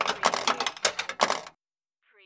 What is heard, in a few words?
Pinball sound, ball being knocked between several objects